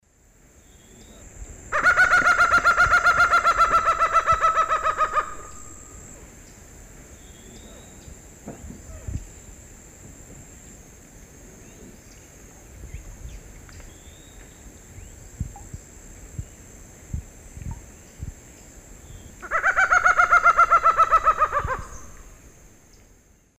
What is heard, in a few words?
Herpetotheres cachinnans, laugh; S. America; Eastern Lowlands